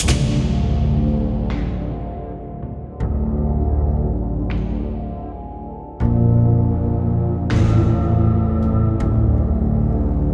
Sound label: Workshop Music; Five Nights at Freddys; FNAF Special Delivery; android ios